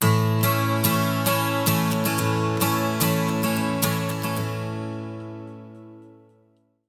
110 MAJ 12 STR, Acoustic Guitar Loops

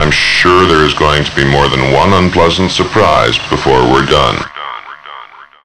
Unpleasant Surprise, Vox EFX; Zero-G; Total Drum Bass

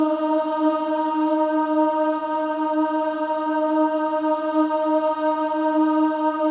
WOMEN AHS D#4; Spectrasonics; Symphony of Voices

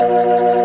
B3 C 1, ORGAN; VOCAL ORGAN; ORGAN #4

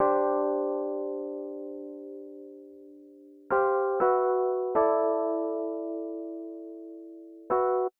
120 rhodes chords C, Keys, Nu Jazz House; PowerFX

